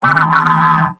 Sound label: battlecry; Pyro